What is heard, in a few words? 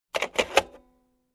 Inserting tape on an old camera